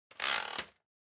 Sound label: Vacuum Cleaner Pipe Extend; 掃除機; パイプ 伸ばす